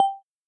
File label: notify